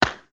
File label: Clap n Snap, Snares